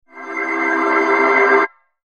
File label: SHIMMER PAD, Shimmer Pad Crescendo; Musical Sound Design; Blastwave